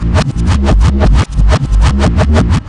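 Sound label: REVERSE BEAT; Big Beat Samples & More; AKAI; ALL LOOP KIT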